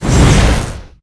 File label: skill explo